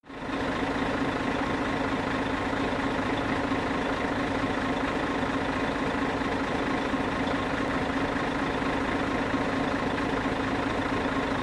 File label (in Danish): KØRETØJER Bus holder stille i tomgang